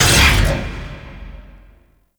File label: Moon Security Sentinel Stop; Warframe; Orokin Moon; Ambience